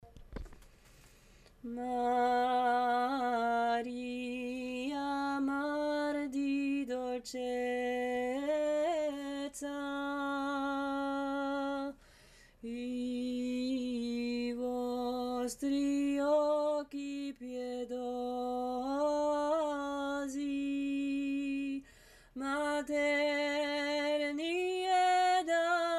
couplet

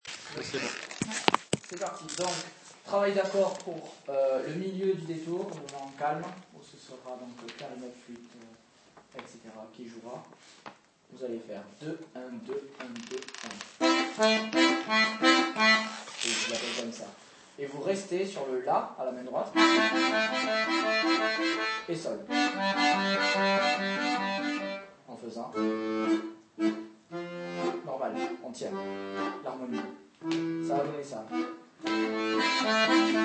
Arpeges valse; le Detour

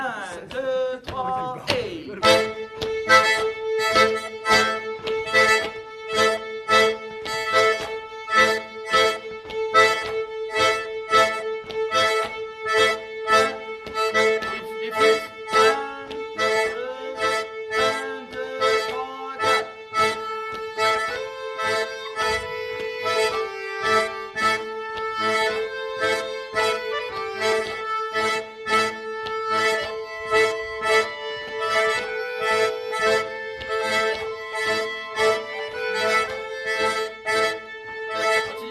RYTHMIQUE ACCORDEON VIOLON

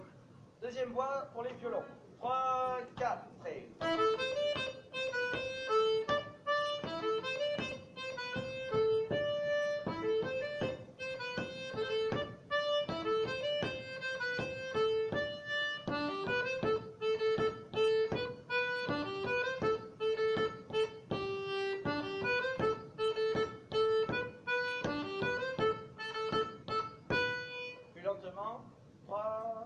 Andro Voix 2 pour violons; Nouvel Andro; Musique d-ensemble

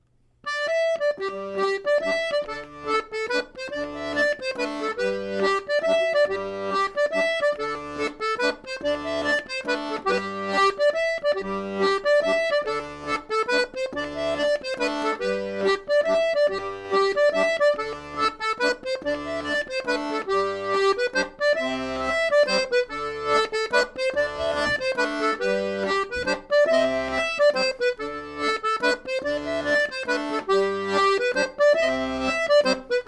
mazurka; rencontres-2014

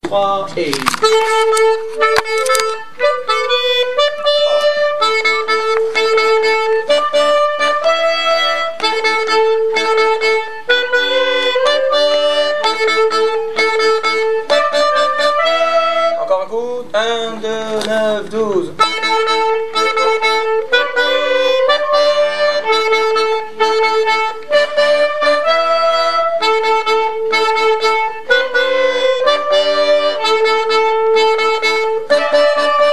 plan soufflants; Chavalen Romalen

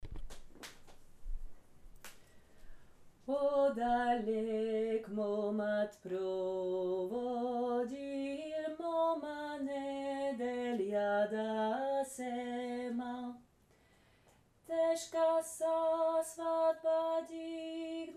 basse; Prochula

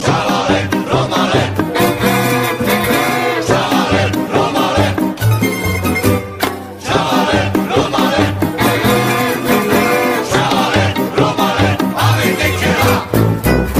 plan milieu chante; Musique d-ensemble

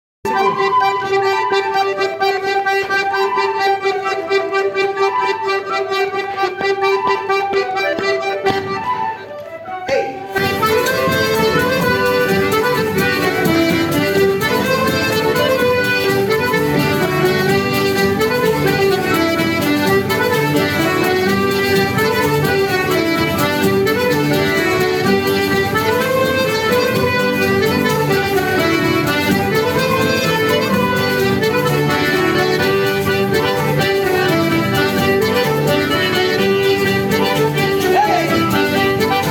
Rythmique diato

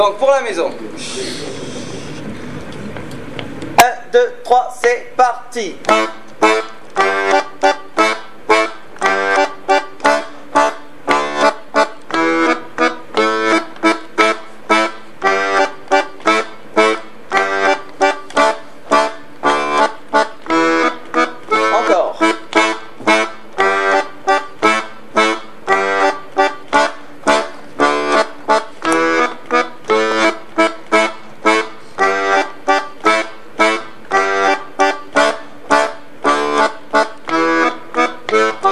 marquage pour melodie; Bourree Caraibes

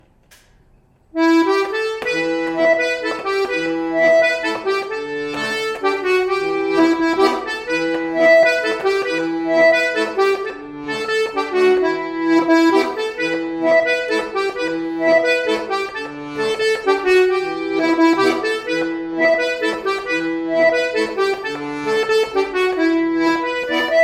Mazurka bretonne; Répertoire collectif 21 22

